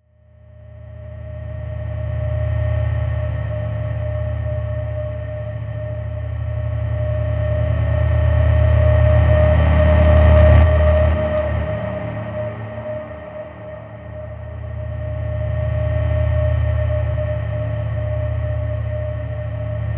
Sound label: Ocean Waves